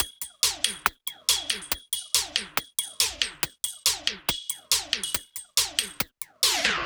Loops 140 BPM Hihat Percussion Loops

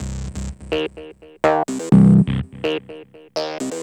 ARP, House arp loops; Best Service ProSamples vol.10 - House; AKAI

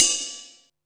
RIDE CYMBAL

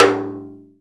RIM, Timbale; Timbale Battery

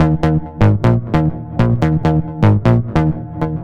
Techno Groove Bass, 132bpm; Zero-G; Soundclash; Combi Loops; Nu Dance Electro

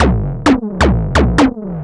Drums Strange

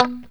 POP B3, STRAT guitar

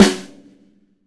snare, LUDWIG SNARE

